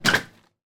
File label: household freezer compartment door flap close